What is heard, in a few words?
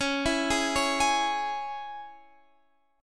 Db Minor Db down Guitar Standard; arpeggiated